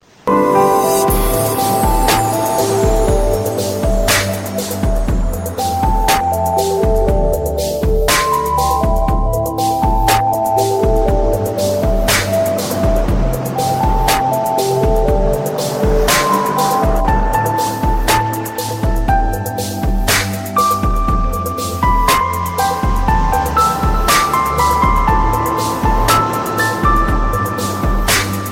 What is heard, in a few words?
Seashore Tune